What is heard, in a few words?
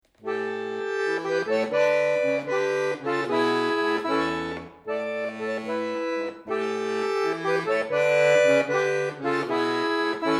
musette miniature slow rock